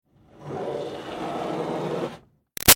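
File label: Water Spray Interior Car Steady Hard; Car Wash; BigRoomSound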